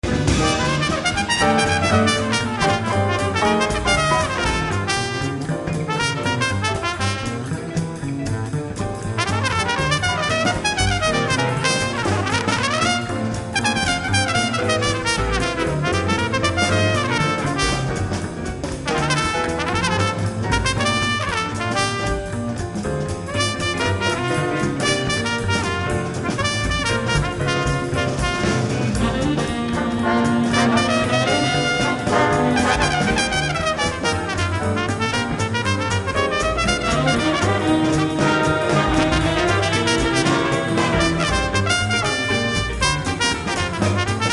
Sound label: solo